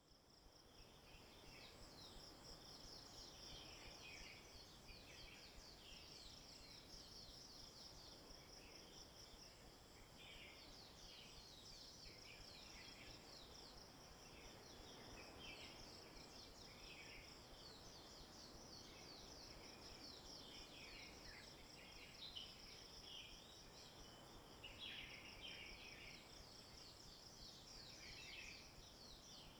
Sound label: ROOMTONE IN BAMBOO HUT, MORNING, AT SUNRISE, BIRDS, NATURE, ROOSTER, WAVES IN BG, INDIA; NEIL ISLAND ANDAMA; Creative Audio Pool; INCREDIBLE SOUNDS OF INDIA COLLECTION